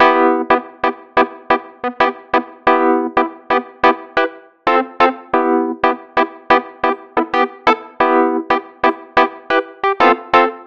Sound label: Wurli; keys